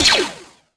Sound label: bad blaster; weapons